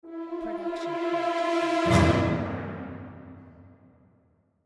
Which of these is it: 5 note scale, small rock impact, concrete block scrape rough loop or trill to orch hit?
trill to orch hit